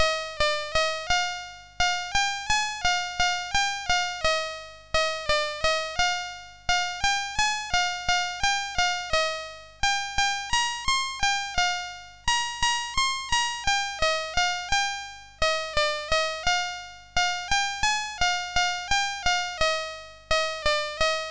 sid MOS6581R3; My Lovely Tune

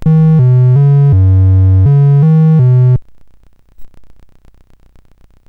Big Ben, CSG8580R5; GAMES

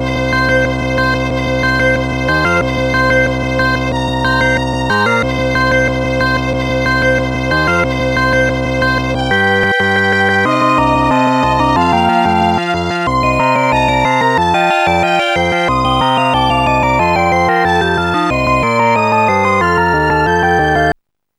c minor jive; Instant Music

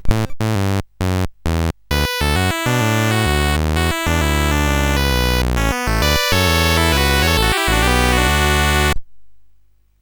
Number Bowling, CSG8580R5; GAMES

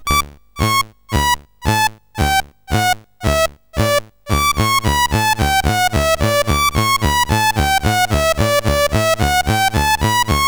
sid CSG8580R5; Midnight Express BASIC

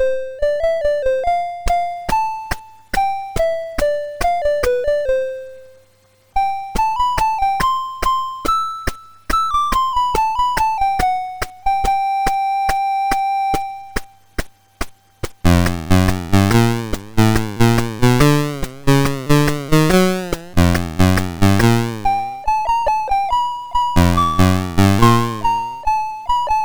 sid MOS6581R4; Musica Abstract

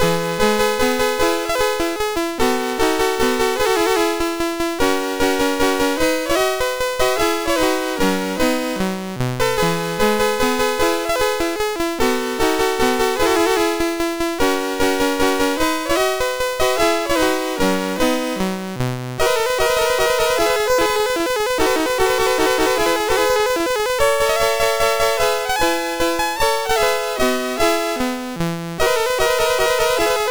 Minuet BASIC MOS6581R3; Don Giovanni

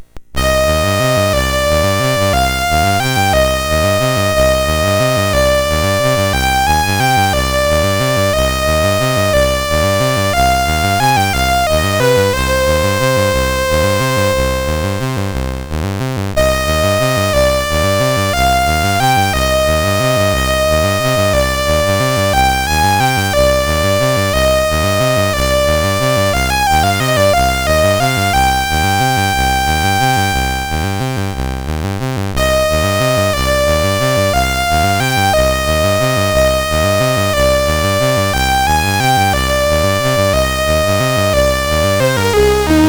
Waltz, MOS6581R4; Waltz Meloncolia; Carl Benton

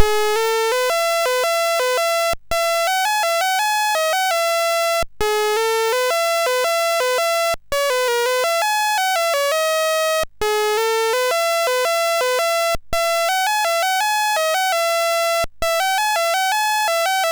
sid MOS6581R3; Rattler

